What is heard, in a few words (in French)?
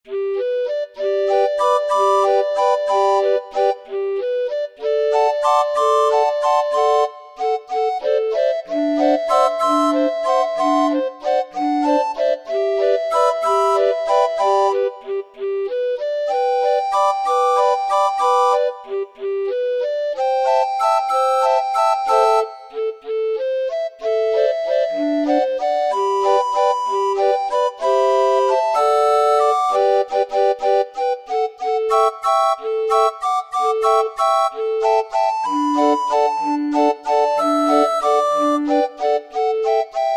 orgue barbarie; The beautiful blue Danube